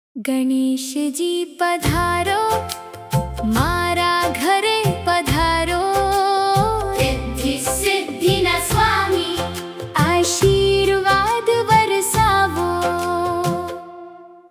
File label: gujarati ganeshji padharo, ringtone